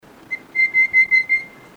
Rufous capped Babbler, birdcall

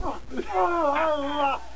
rrruh

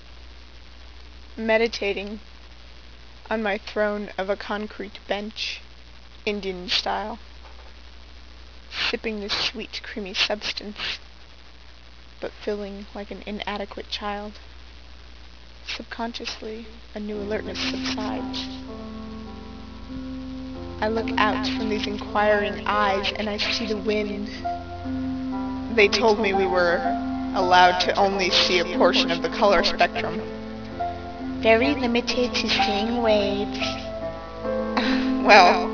outdoors

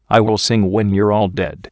sing

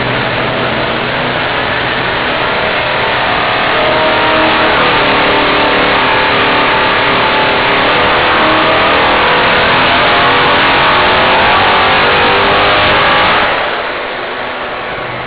In Dyno Room

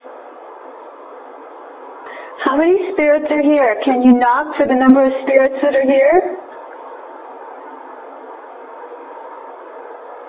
faint laughing

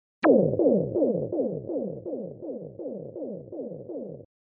Deep Space

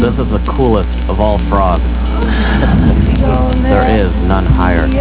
chicken

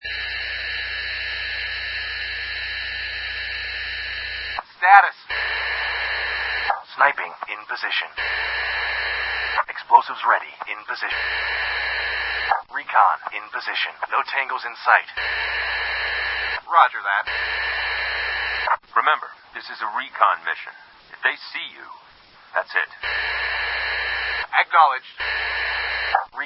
sound effect

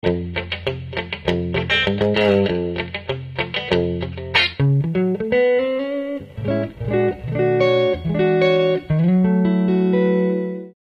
Fender AD Tele, guitar sounds

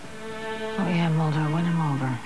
win

